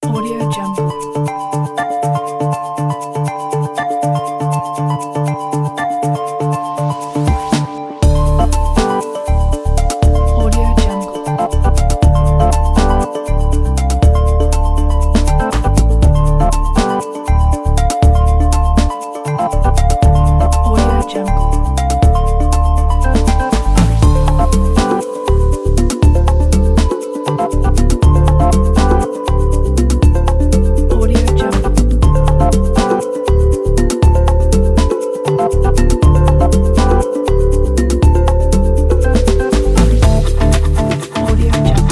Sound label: hot news interesting facts technology